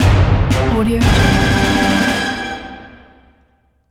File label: horror sting